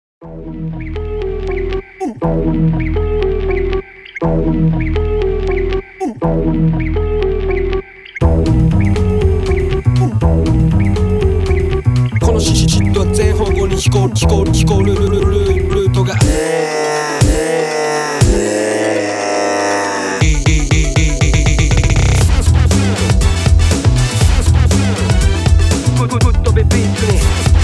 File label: Inst